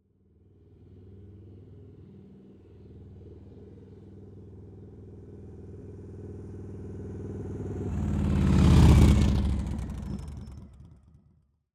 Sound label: Motorcycle,Victory Vegas,96 Cubic Inch,1507 CC,Up,30 kph,By,Off; Airborne Sound